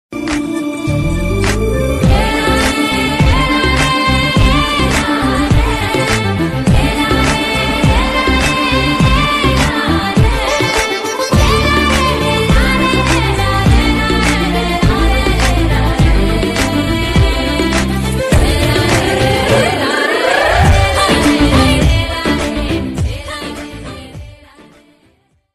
instrumental bgm ringtone telugu love bgm ringtone; Fidaa movie; south ringtone